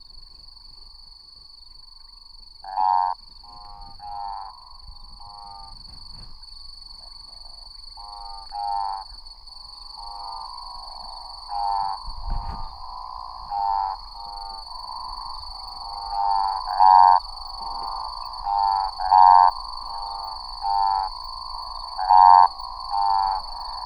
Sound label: Odontophrynus juquinha